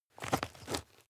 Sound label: step dirt heavy boots scuff short